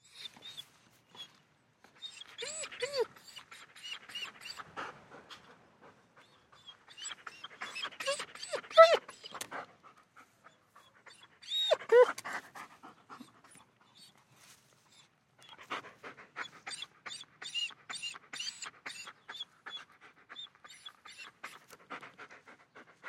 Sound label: Dog German Shepherd Pants Whines Light; Dogs; Animals